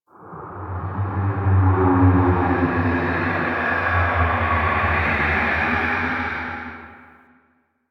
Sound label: Ghostly Whoosh; Ambience; Man In The Wall